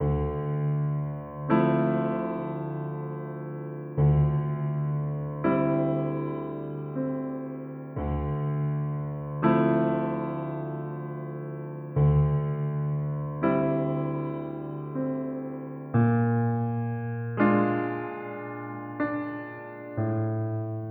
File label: Soft Jazz